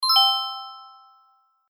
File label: review one, notification